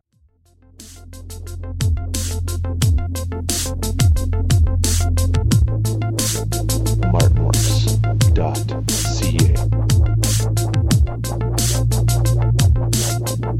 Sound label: plastic carnival song